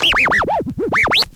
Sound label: SCRATCHES; Scratch 1